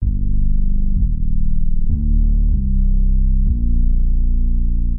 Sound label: HipHop Bass